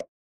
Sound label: cartoon pop